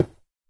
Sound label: stone, blocks